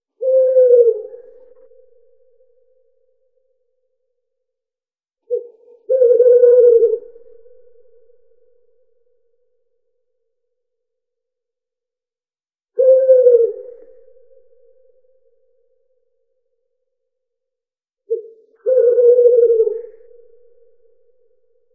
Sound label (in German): Der Uhu Ruf in der Nacht; Voegel in Europa